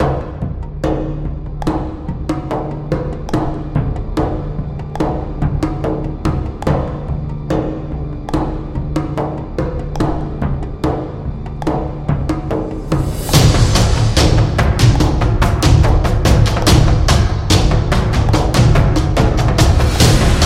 Cinematic Drums; suspense

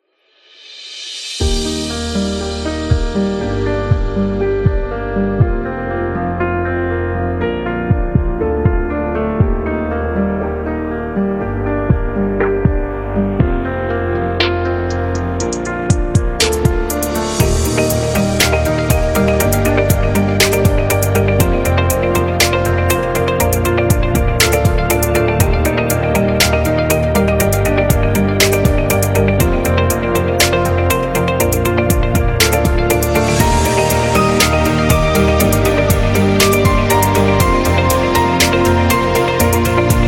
Ambient Background Corporate

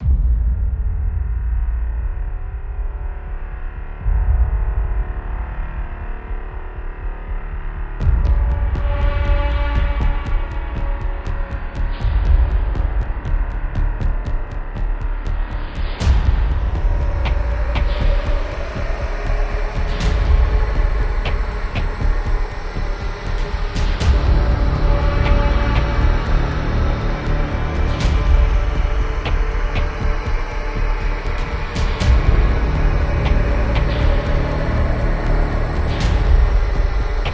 Disturbing

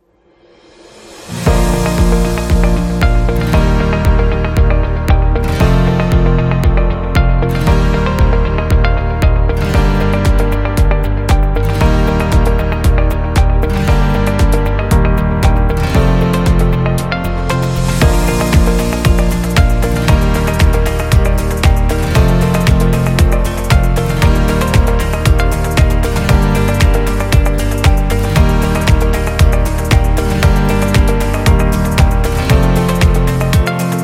Dynamic; corporate success

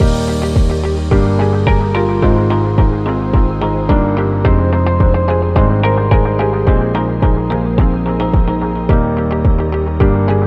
Business Loop Corporate

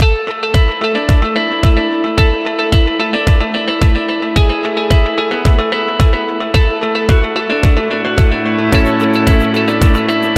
Soft Background Music

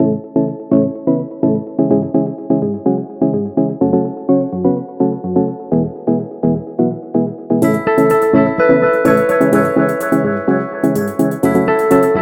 Soft Minimal Corporate, corporate music; corporate music vol 7

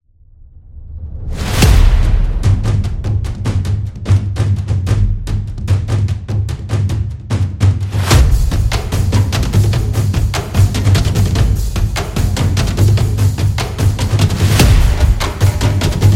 Tribal Drum Show; tribal dance

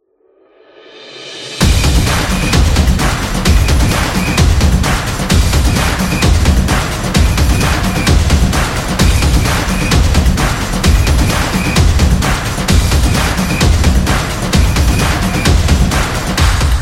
Sport Beat; sports

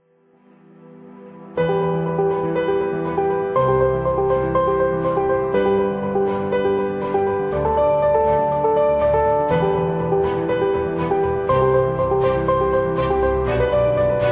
Documentary Epic; self motivation

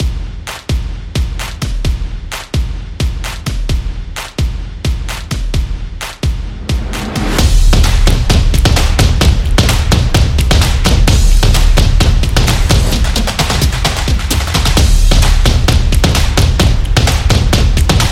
Drum Clap

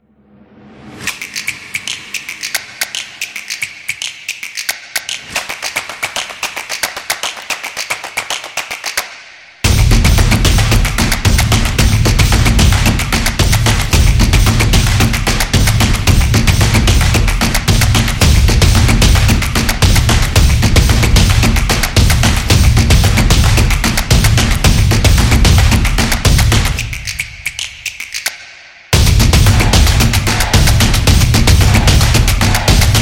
Upbeat Dynamic Drums; football drums

